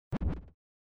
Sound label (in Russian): gul bombi